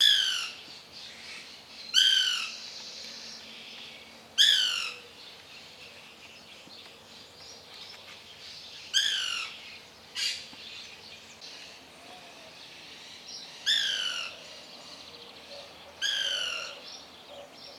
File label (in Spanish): carpintero blanco voz; aves